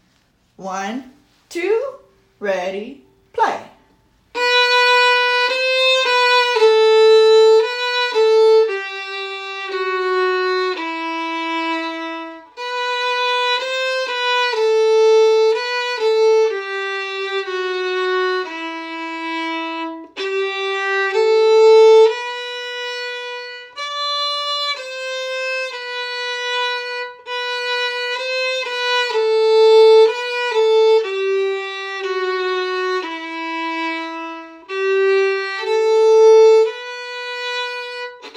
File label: Bohemian Folk Song